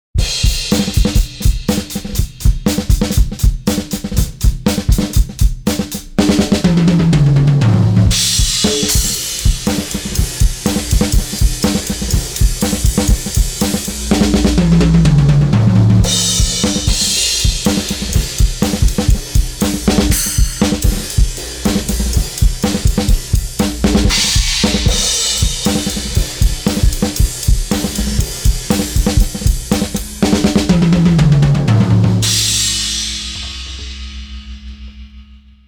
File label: drums; Titanium 3